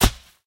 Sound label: bullet dirt